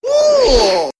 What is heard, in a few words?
woo martial arts; ooh ahh; ho hoo woo